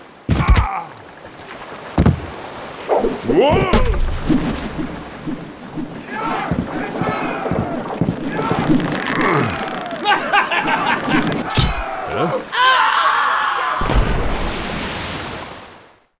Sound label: duel outro amb win; Sid Meiers Pirates; XBOX